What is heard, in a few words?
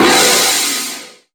Impacts